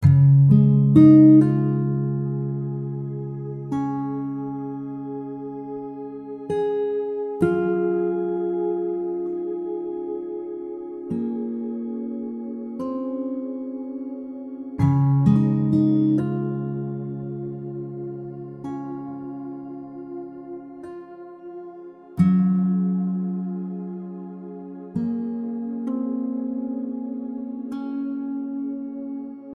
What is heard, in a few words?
Acoustic Guitar II; Storytelling-Essentials